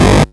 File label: Arcade Riffs